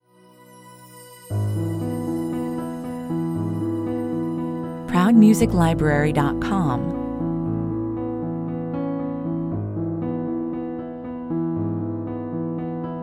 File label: 59 bpm, A soft and tender acoustic piano solo